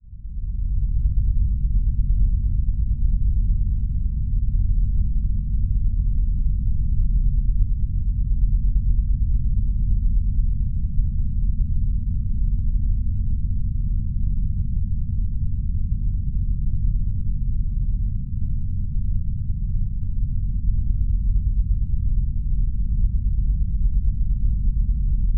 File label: Drone Musical Subsonic; Production Element Imaging; AdobeCC